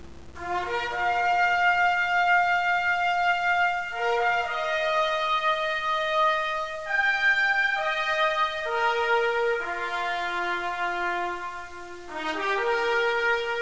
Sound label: fanfare